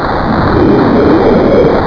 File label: WHOOSH